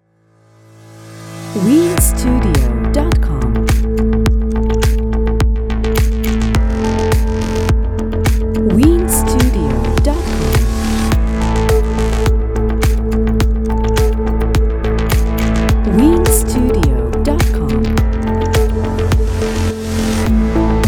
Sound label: Future Technology Corporate